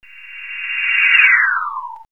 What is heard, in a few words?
Passing Asteroid, sci fi synth fx; X-Static Goldmine